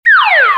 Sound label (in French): Sifflet